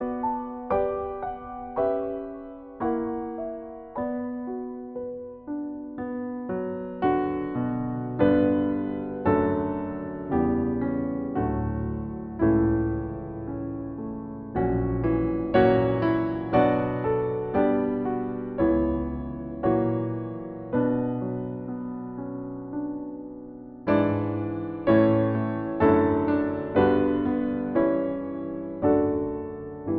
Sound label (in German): Instrumental; O Haupt voll Blut und Wunden